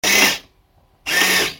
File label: servo